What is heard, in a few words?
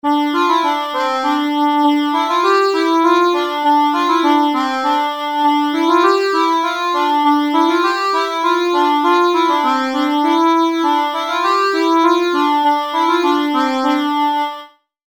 hanter dro